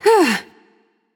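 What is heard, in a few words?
grunt attack, female; smite; voicepack; hera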